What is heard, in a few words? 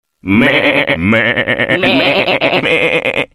Animal Sheep Funny